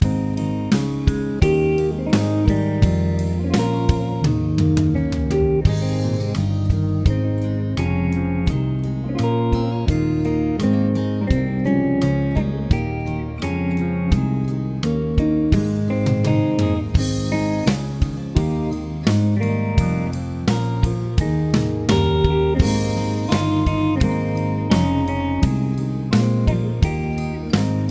Bb Major Scale; brass

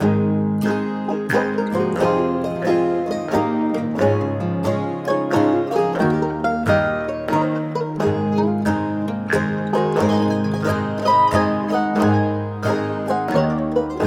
Warmup in Bb; brass

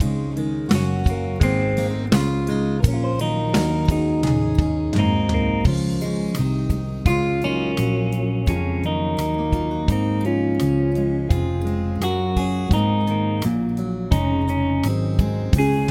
Eb Bb Major Scale, brass

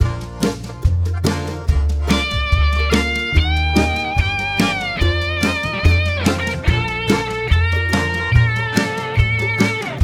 Bb Semi Quavers

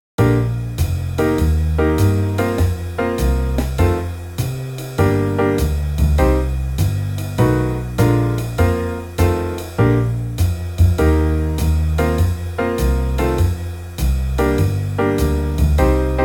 Bb Warm Up

